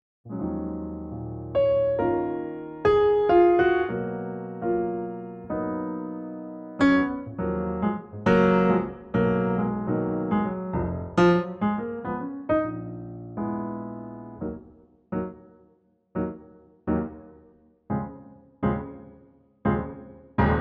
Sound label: Ebm Piano